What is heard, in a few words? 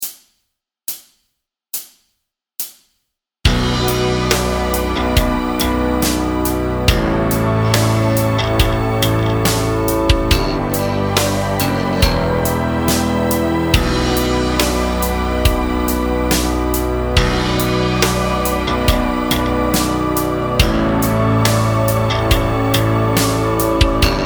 Backing Track